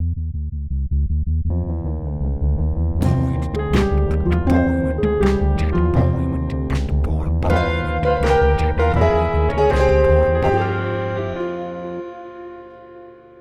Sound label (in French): generique cafe